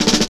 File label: SN BUZ R; East West - Phat & Phunky CD2; 90's Sample CDs